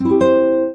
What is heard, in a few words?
Classic Guitar, notifications